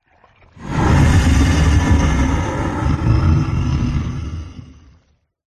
horror monster swamp huge menacing growl slimy bubbles